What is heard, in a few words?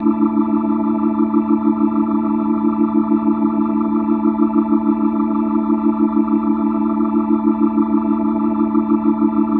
Organ A Sus, Hip-Hop Organ Licks